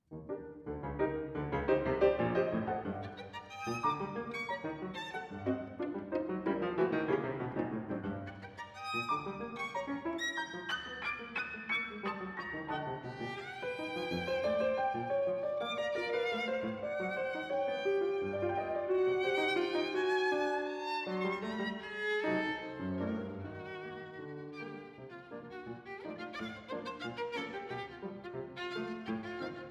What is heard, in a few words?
Divertimento, transcription for violin & piano